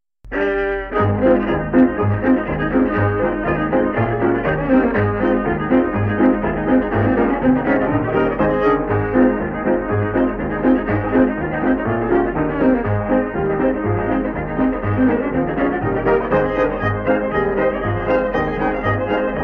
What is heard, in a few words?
medley (instrumental)